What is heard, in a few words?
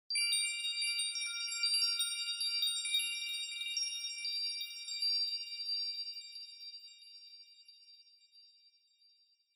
Onoma Sparkle Long